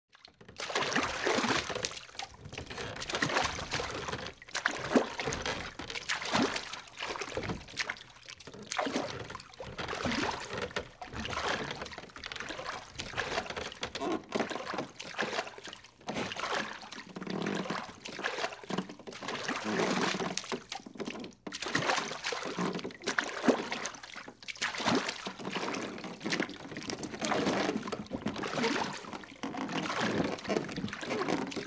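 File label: Row Boat Creaks Paddle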